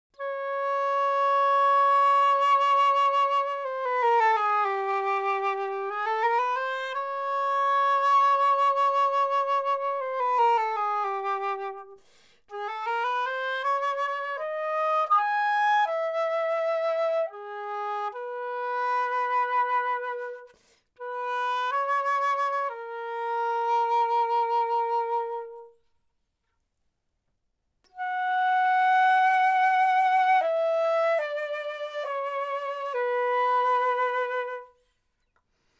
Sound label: Instrumental, Flute